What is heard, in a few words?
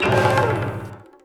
Restaurant - Combo Host Stab